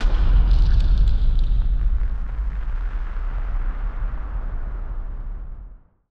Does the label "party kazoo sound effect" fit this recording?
No